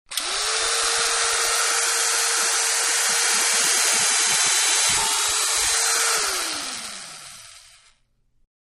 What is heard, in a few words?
Electric Drill-One Hole; Drills (Sound Effects:Tools); Manhattan Production Music